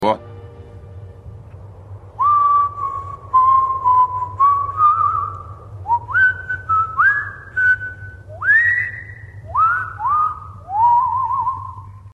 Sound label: svist, korella